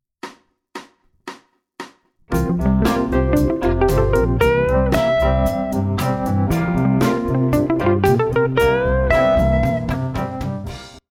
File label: Mixolydian Lick